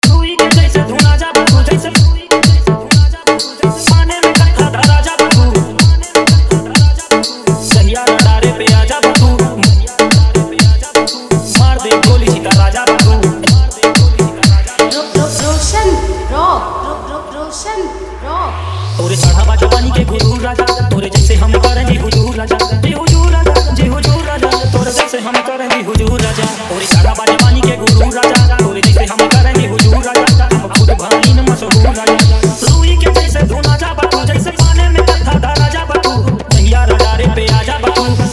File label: Hit Bhojpuri Mix Full Vibration; Dj Roshan Rock Rkm